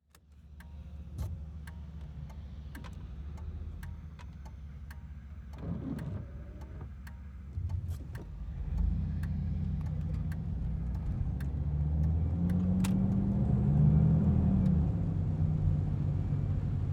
Ambience Van Driving In Rain Wipers On High Turn Signal Interior; AdobeCC